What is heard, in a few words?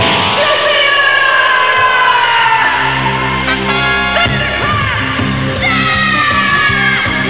thunderclap